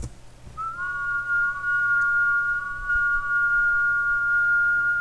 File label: Blues